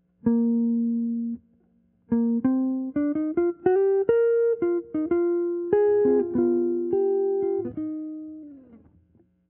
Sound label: Key Center Bb Eb